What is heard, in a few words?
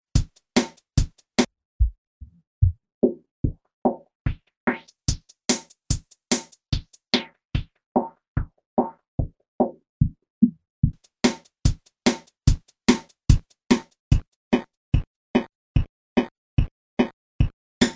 fx filter + lofi